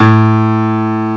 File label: PIANO L, PIANO; CMI Sound Library Rev 1.3